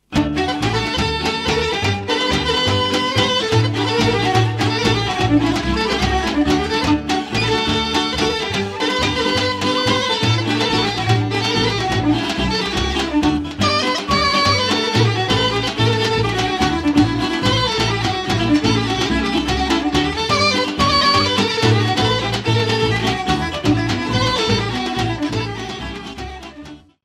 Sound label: Rachenitsa na horo